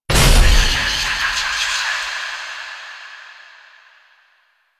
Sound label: Vaults Vault Open; Entrati; Warframe